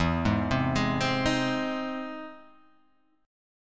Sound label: E Augmented Major 7th E, E+M7 chord; arpeggiated; JGuitar